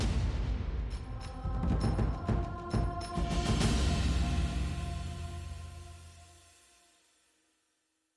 drum trans; LoL; Game Music Events

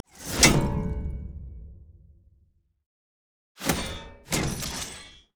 emote laugh; jayce

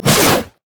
swipe; nidalee

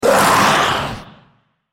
Dragon spell cast effort; Shyvana

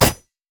basic attack; LoL; akali

